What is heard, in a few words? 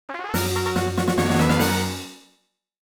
Fanfare - Jackpot; Pokémon FireRed and LeafGreen Remastered